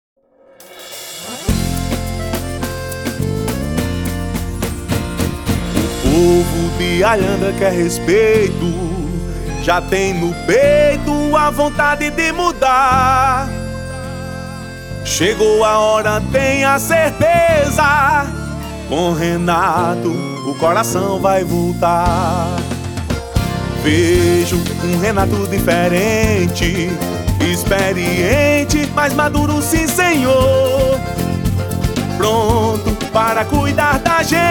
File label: JINGLE